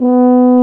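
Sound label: brass, C Tuba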